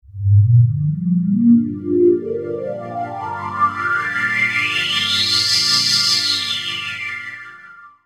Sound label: Digi Pads; Jungle Frenzy 1